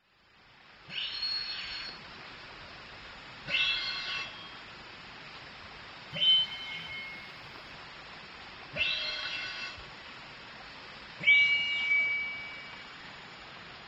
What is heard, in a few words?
Crax Alberti; Colombian turkey